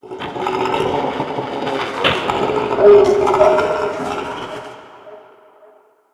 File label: Medium Creature Chatter; UnderCity